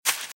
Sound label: Velociraptor - Footstep - Grass - Run - Right